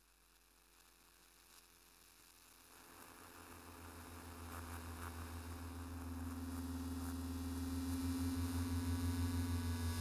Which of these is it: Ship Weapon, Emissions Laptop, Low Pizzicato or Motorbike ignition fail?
Emissions Laptop